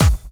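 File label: snare drum bass drum, Dance Mega Drums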